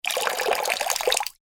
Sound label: water pour shallow, Water Poured Into Shallow Water; Pouring